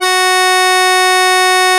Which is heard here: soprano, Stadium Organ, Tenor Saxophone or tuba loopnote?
tuba loopnote